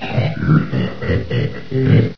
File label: baby panther idle; svencoop; custom monsters